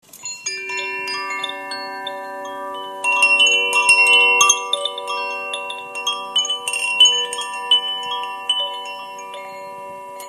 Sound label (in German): Koshi Feuer